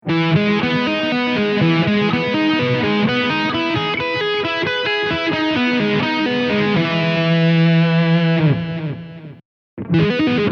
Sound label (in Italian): Mi arpeggio, guitar